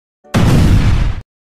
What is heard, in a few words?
explosion; chikn nuggit